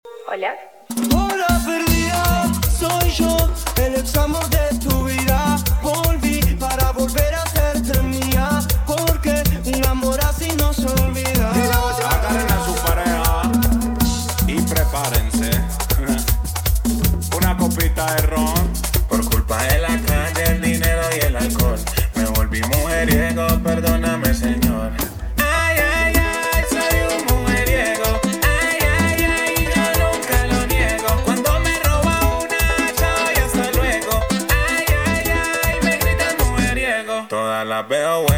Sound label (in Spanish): merengue flow mix